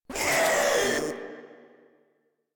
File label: Pain; Proto Infested; Warframe; Infested Ninety Nine